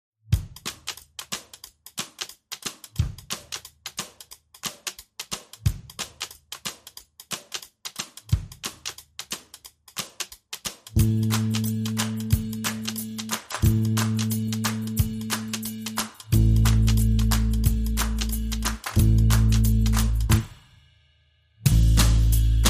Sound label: Drums and Bass Mix